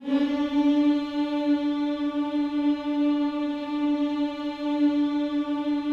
HMMS D 4, Vocal Planet Gospel; Spectrasonics; Vocal Planet Vol.1 Gospel; akai

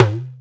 R8 PRC; E-Lab; X Static Goldmine 1

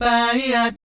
BAYEEAT, Vocal Planet Jazz Fx; Spectrasonics

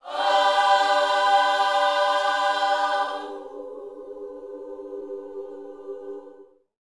YOUTH CH PHRASE, Vocal Planet Gospel

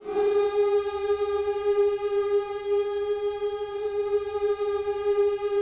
HMMS G#, Gospel; Spectrasonics; Vocal Planet Vol.1 Gospel; akai

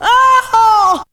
VOC

